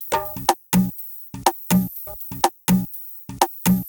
Top F# 123bpm, House Drumloops; Zero-G House Fabrik Drumloops

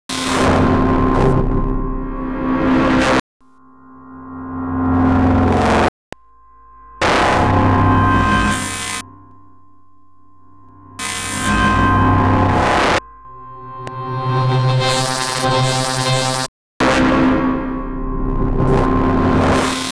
Metal; AMG - Prototype Drum & Bass

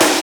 SNR BEND, Snares; Roland L-CDX-01; Snares 5; Sn Modules 5

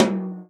tom, Real Drum Kit, jungle kit

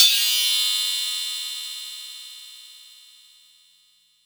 Metal

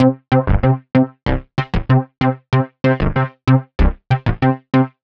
Modu Knocka 95, horrorscape samples 95bpm

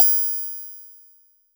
Triangle Open, Percussion; Roland BK-5

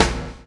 CHAIN; Spectrasonics - Backbeat

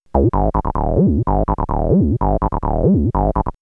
303 Loops; X-Static Goldmine

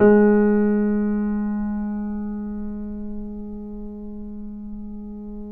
PP G#2, Grand Piano off mic; AKAI S6000 CD-ROM - Volume 2

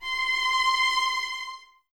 String Ensembles, Violas, VLS Detache

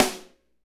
snare, R&B Snares, Roland

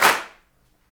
CLAPS, Africa Handclaps

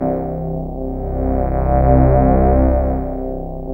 SYN MORP, Roland; Sound & Vision - Gigapack II

